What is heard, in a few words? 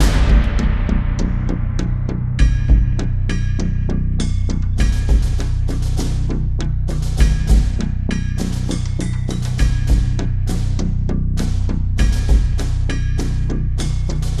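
music, bass percussion loop; transformers war for cybertron gamerip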